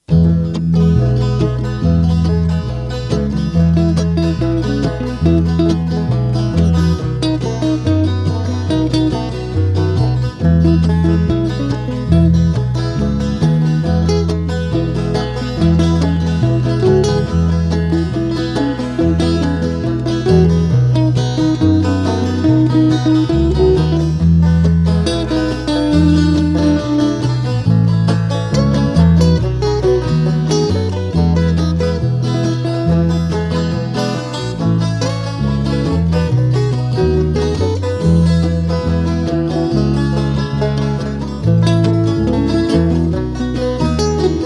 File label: banjo